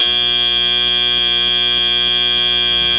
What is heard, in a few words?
end match